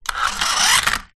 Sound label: leisure radio controlled car rev crash; zapsplat